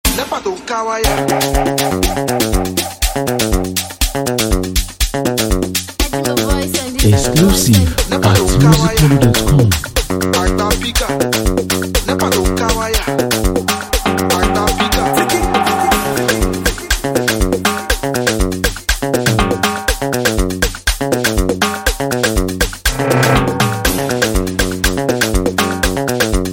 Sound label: Wire Beat